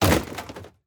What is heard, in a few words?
plastic break